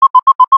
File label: Arcade Chirp, Video Game Sounds; 1001 Sound Effects